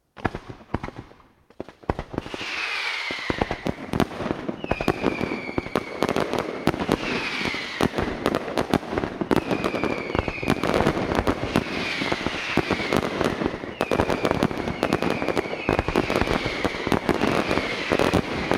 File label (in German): feuerwerk